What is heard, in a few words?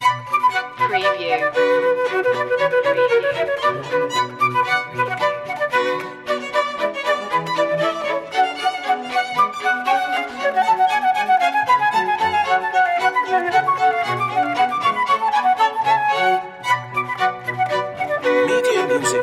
string quartet; jsbach badinerie orchl suite no2 bminor bwv 1067 mov7